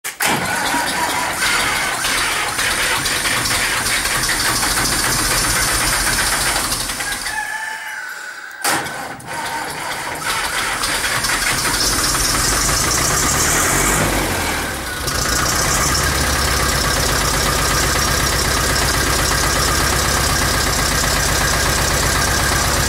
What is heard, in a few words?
Engine starting sound (Ursus-C360)